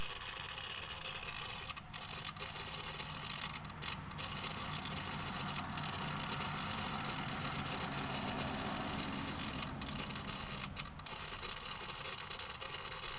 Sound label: spark, 1g dsm no spark odd sound; eagle